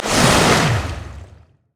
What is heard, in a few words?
surging tides; nami base